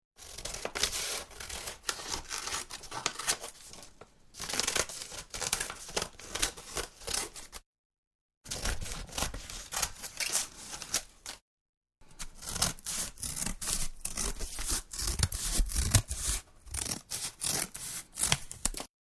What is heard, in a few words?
Cutting Paper; Household